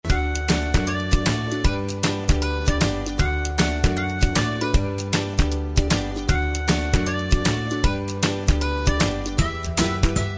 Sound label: game BGM